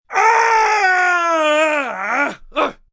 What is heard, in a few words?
Scream Frustrate, Scream, male, pain, frustration, low, fear, terror (human voice); Screaming (Sound Effects:Voice)